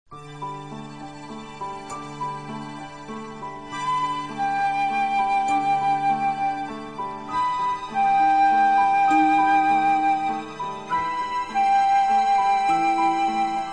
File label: Orchestra, Strings